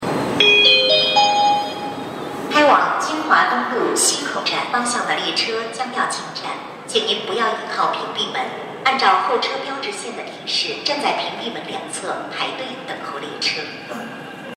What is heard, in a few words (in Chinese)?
15号线-清华东路西口方向进站